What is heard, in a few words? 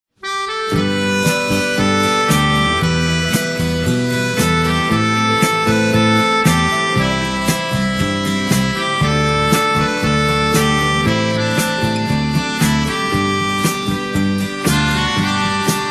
Romantic Tone